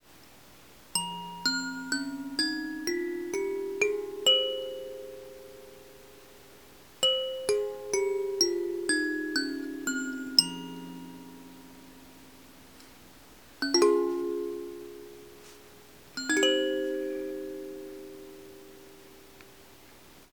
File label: 8 note kalimba instrument kalimbas mbira; Fintegral